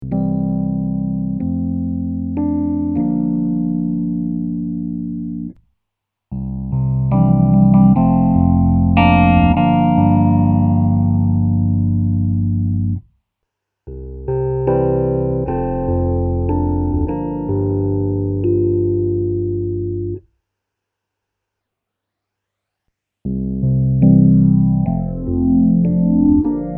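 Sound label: Phaser Rhodes Bypass 6Stage 12Stage 6 mit Modulation 12 mit Modulation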